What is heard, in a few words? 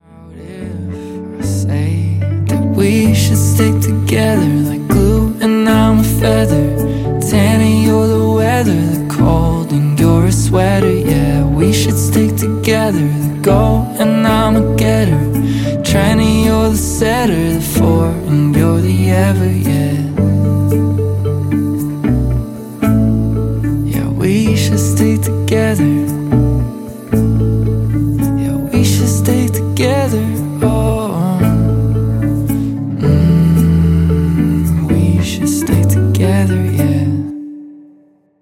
Acoustic